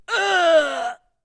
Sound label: Injured Grunt; Warframe; Tutorial; Injured Ostrons